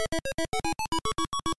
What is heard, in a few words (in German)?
letzter feind; Dig Dug